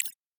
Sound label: Ui Popup button hover Stereo